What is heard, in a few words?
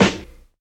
verby hip hop snare drum sample g key